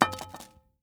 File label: Arrow Fall Ground; Timelock VR